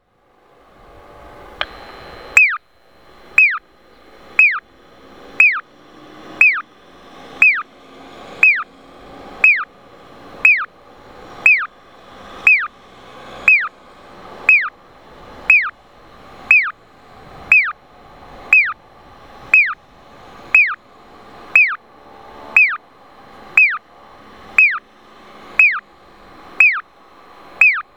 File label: piyo, onkyo kosaten; koito MP-I-2; aichi; toyohashi; takashiekinishi